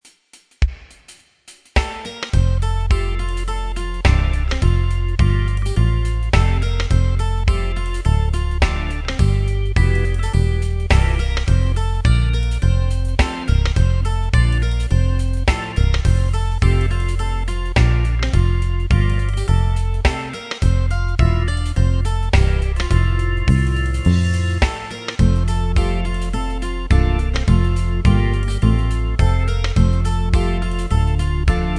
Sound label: Reggae